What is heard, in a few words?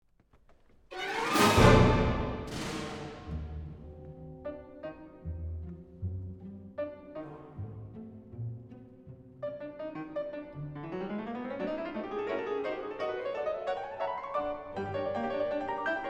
Piano Concerto No 4